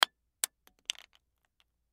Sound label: Plastic Drop Fall; foley; Drop Sound Effects; Objects; Blastwave